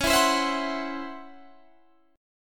C sharp Minor Major 7th Double Flat 5th Guitar Standard; C#mM7bb5; strummed; JGuitar